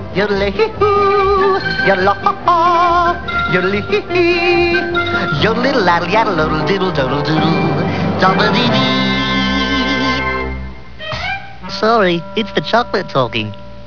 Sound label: YODEL